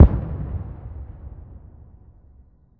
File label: Explosion Gasbag; War Ambience; Prydwen